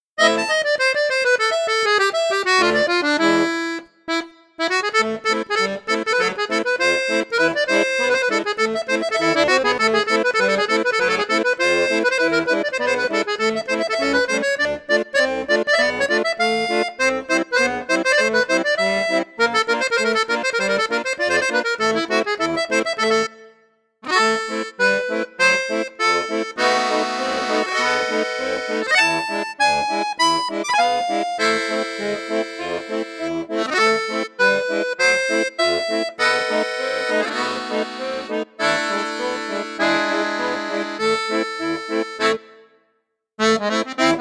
registre flute juste, accordéon; piermaria convertor

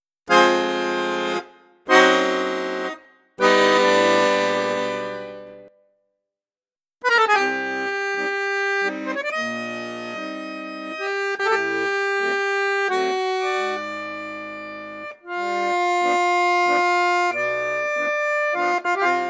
registre basson basson octave flute juste, accordeon; accordiola

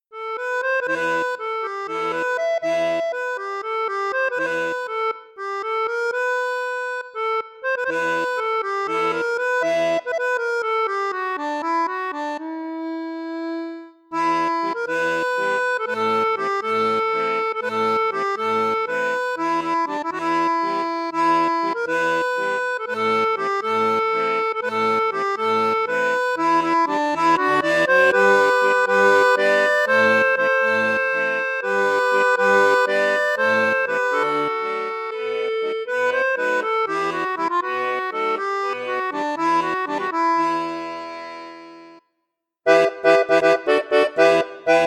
registre basson; savoia cassotto ii

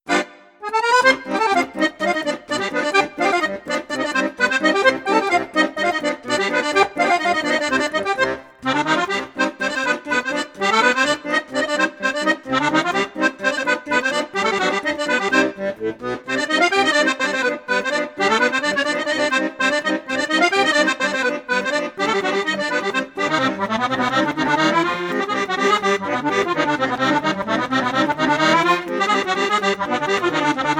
registre basson 3 flutes, accordeon; accordiola

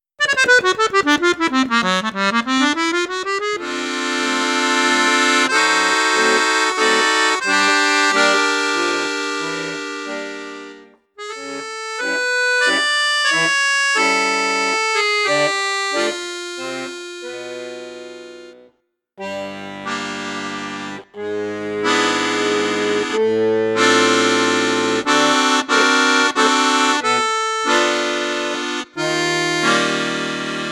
registre flute juste, accordeon; piermaria prodige plus

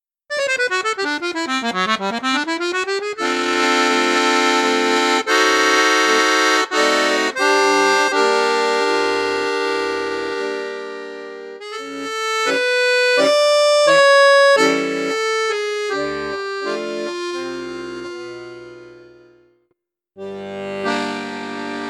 registre flute juste, accordeon; mengascini retro

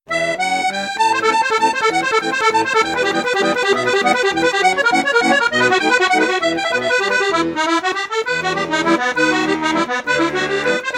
registre 3 flutes, accordéon; accordiola

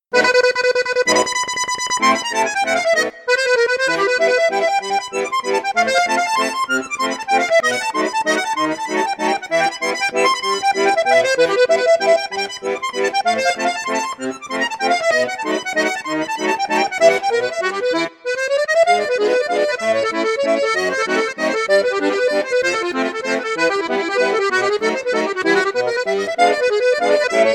registre flute juste flute haute valse, accordeon; perle d'or

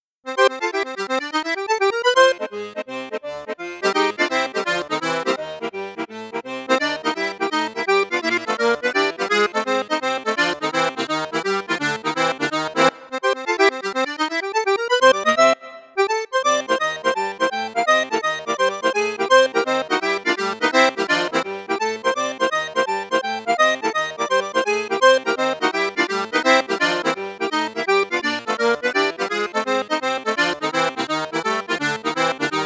registre gros basson flute juste flute haute, accordeon; cavagnolo vedette; cavagnolo vedette 5

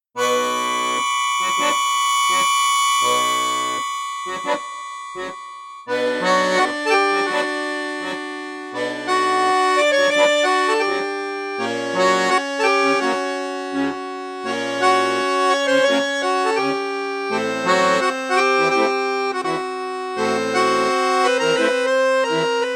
registre basson flute juste, accordeon; piermaria prodige plus

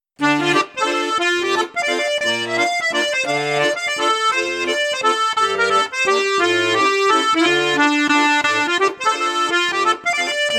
registre flute juste flute haute, accordeon; piermaria prodige

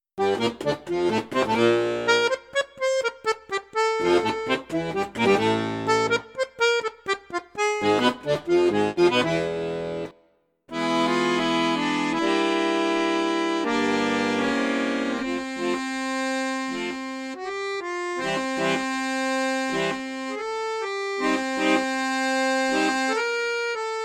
registre basson flute juste, accordeon; cavagnolo professionnel 12

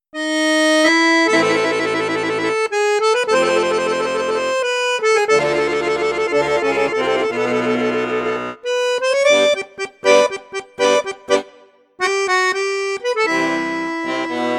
registre basson flute juste, accordeon; cavagnolo vedette 10

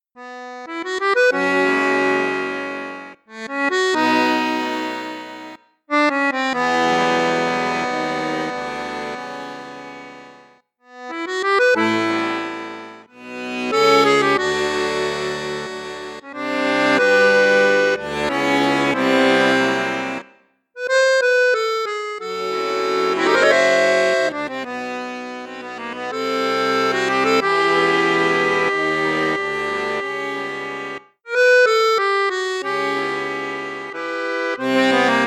registre basson, accordeon; cavagnolo professionnel 10

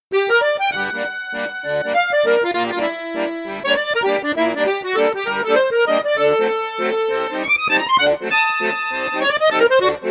registre flute juste flute haute piccolo, accordeon; cavagnolo professionnel 12

